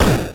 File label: hit; roflnauts